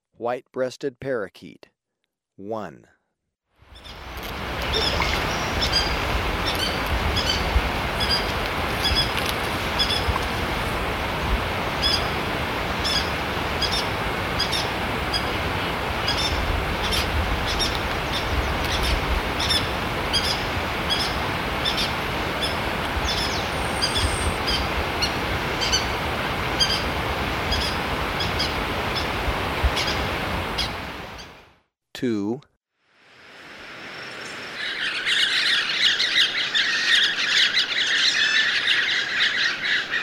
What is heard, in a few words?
White-breasted Parakeet, Birds of Ecuador; S. America